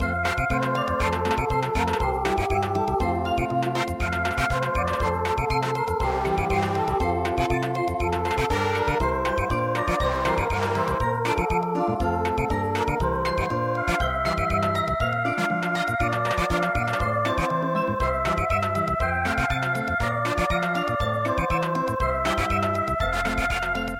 Samba, midi traditional

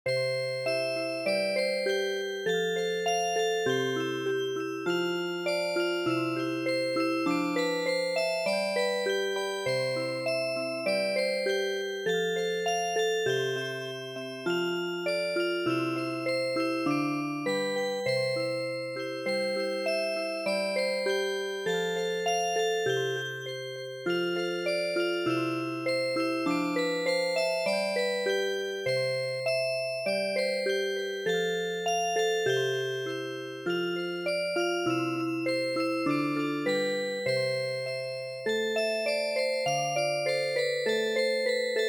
Music Box

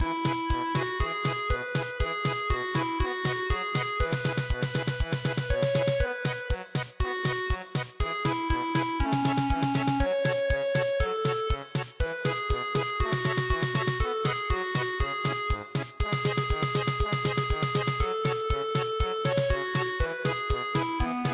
German Polka, midi; traditional

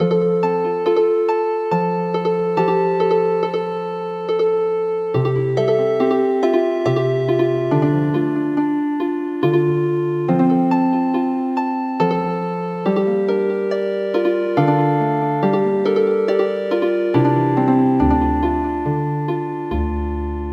yan yan hakkei Healin Harp

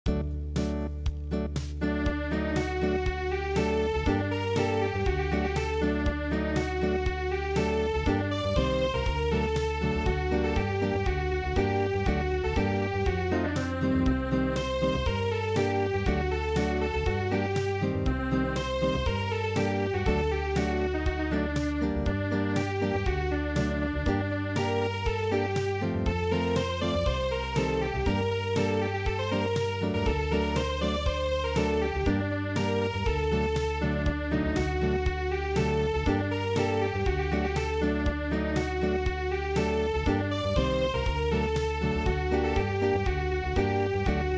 Chassidic Unplugged

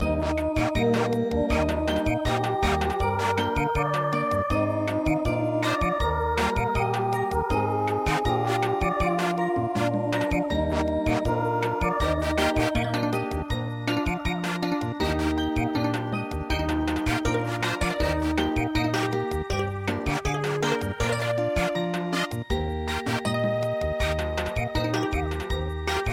Samba

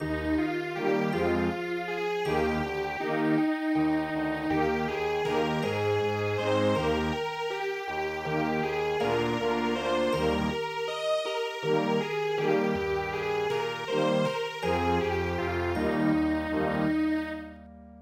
English Petal Vienna Waltz

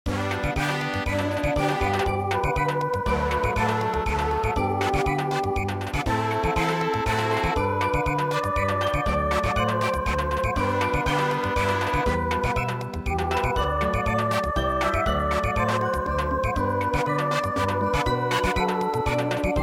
Samba, traditional; midi

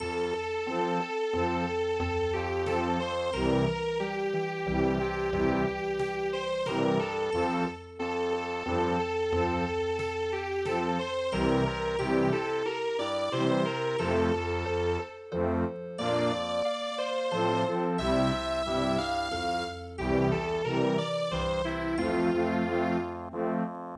Du du liegst mir im Herzen vienna waltz

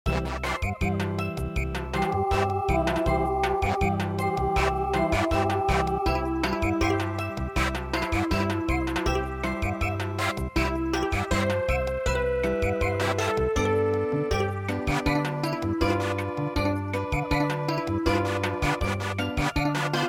Wiegenlied Brahms Samba

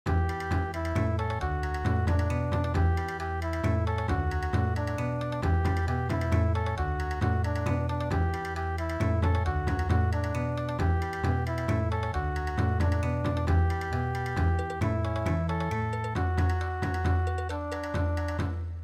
Bon Odori; Bye Baby Bunting

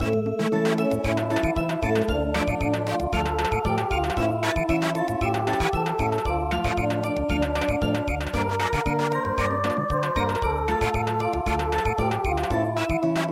Samba

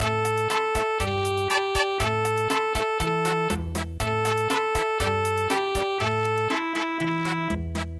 Cha Cha Cha

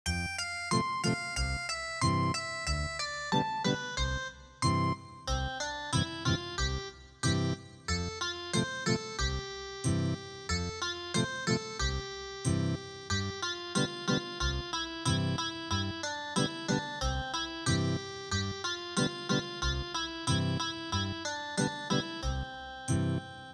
Ethnic Guitar, traditional

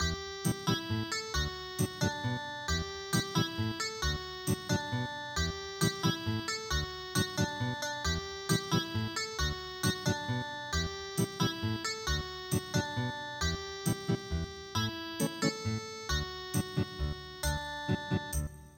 Ethnic Guitar; Bye Baby Bunting

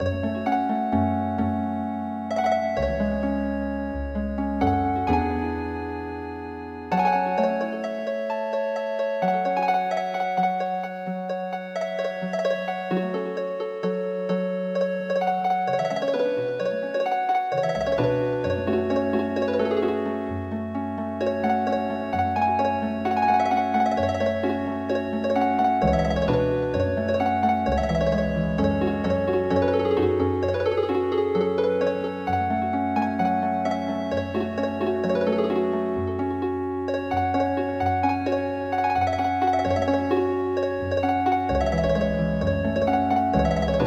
Healing Harp

